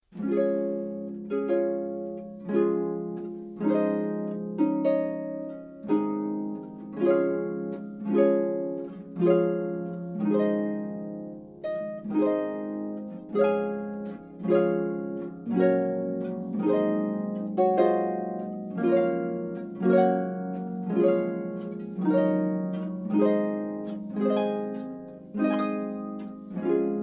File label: Royal Trumpeters